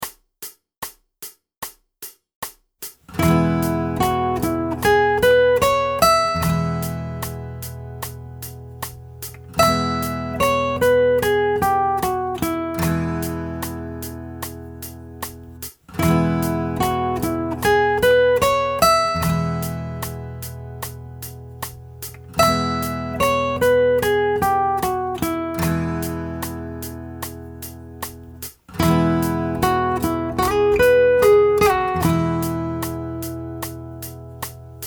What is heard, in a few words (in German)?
Licks in Dur